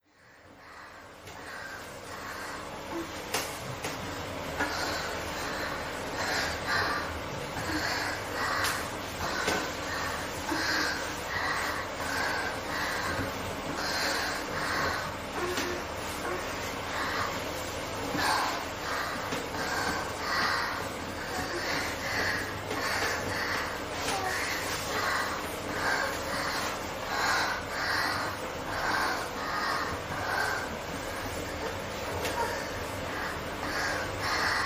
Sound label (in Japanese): 人妻オナニーボイス; 娘の不在中にマンコを弄る淫乱ママのリアルオナニー